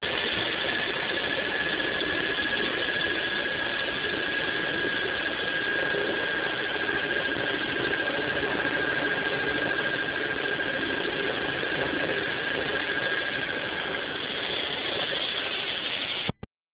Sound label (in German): heli